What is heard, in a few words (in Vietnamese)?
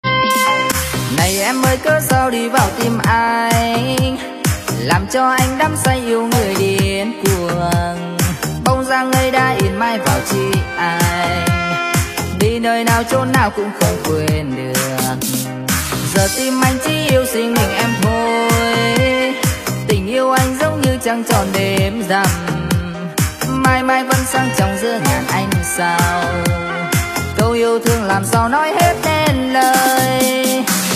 Nhạc chế EDM Gây Nghiện Bass Cực Mạnh Cực Sung